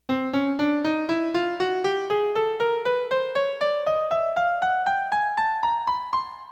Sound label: chromatic scale